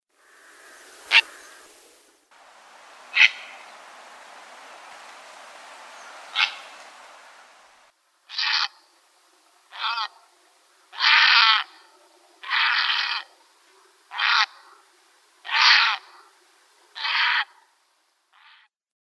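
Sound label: purple heron; Caplja ryzhaja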